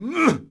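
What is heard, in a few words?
Knight Attack Vox; Civ4 warlords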